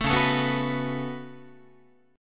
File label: C sharp 7th Suspended 4th Sharp 5th, Guitar; C#7sus4#5; strummed; JGuitar